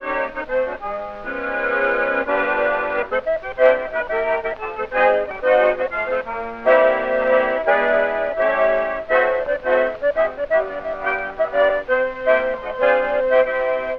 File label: saxophone with orchestra; variety stage